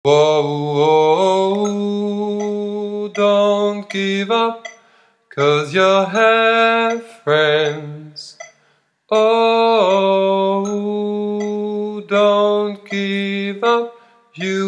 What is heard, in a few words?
Chorus Sopran oktaviert